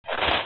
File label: snow thump